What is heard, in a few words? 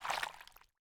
Liquids honey water